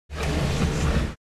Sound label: Moving wooden heavy object